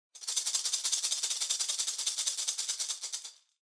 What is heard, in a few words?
Maracas Roll